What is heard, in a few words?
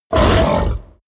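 coloss snarl deep short, Creatures; kreaturen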